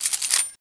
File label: elite twirl; weapons; cstrike